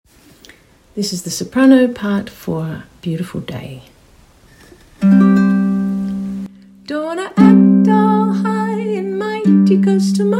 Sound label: Soprano part